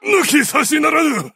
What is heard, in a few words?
hurt vo japanese; 健次; 传奇